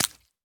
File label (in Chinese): 鱼扑腾声